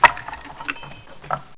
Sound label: button